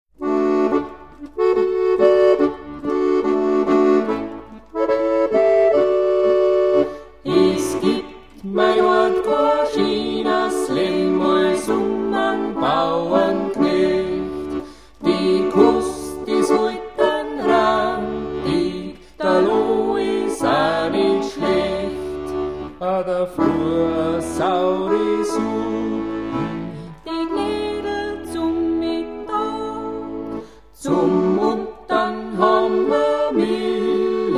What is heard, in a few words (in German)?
Drum